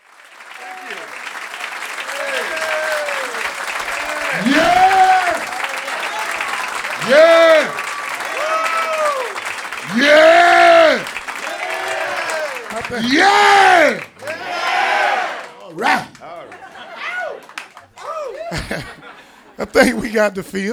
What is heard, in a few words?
applause banter